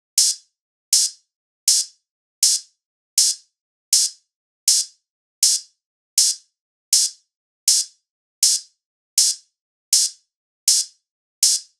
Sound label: loop hat 80; metis